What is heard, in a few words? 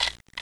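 Contax IIIa, camera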